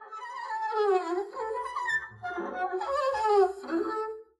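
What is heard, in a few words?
arco noise high long, double bass; dsmolken; noises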